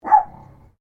Animal Dog Chihuahua Bark Single; Various domestic dogs; Animals; SoundEffects-Foundation1

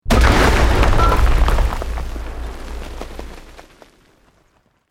EXPLOSION MEDIUM STONE ROCK; Created explosion effects, house, building or vehicle effects; Bombs

